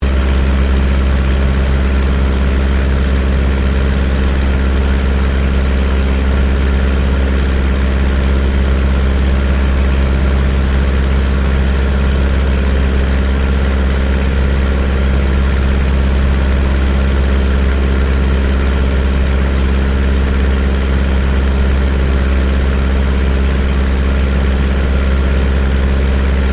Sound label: AIRCRAFT DH82A DEHAVILAND TIGERMOTH FLYING INTERIOR LOOP; RAAF Tigermoth; Airplanes; Vehicles